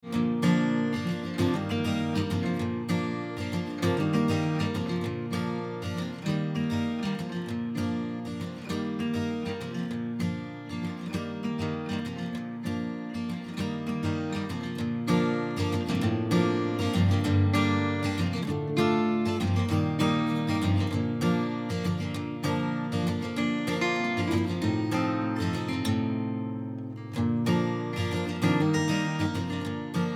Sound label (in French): guitares